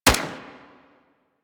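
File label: submachine gun Shot; Weapons; Warframe; Tenno; Sundial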